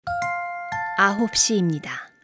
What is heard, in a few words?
Hourly Alert